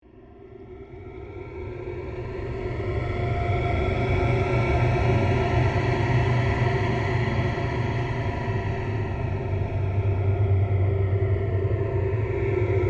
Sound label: Bed Ghostly Airy, Production, Element, Swells, Flange; Beds; SoundEffects-BlastWave